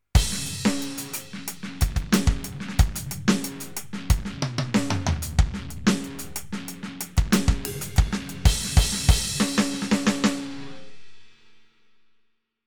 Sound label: Roland TD07 Distorted